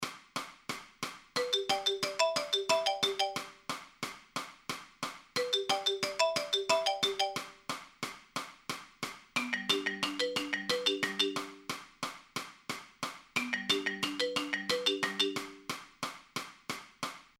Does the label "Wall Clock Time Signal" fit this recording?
No